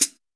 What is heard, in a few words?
good hi hat sound g key